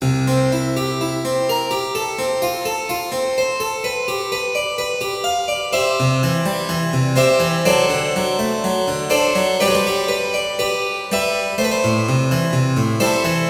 bach prelude c major

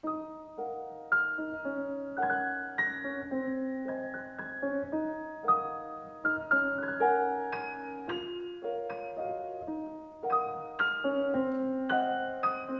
waltz

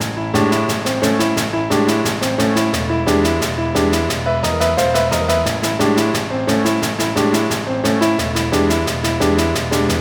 snare dance

